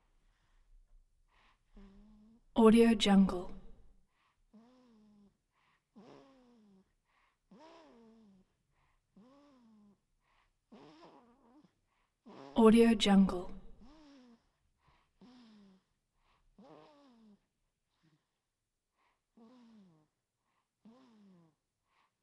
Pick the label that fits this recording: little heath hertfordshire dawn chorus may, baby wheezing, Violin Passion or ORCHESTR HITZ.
baby wheezing